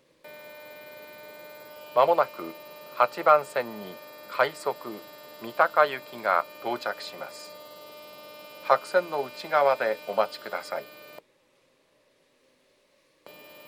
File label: nishi funabashi rapid mitaka, station